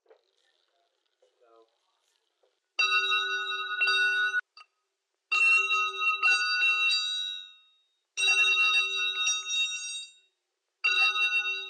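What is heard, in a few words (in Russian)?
сталкивание подков с металлом длинные кольца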